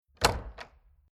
Europe Apartment Hotel Heavy Front Wooden Door Open Unlatched; Miscellaneous Door; Door Sound Effects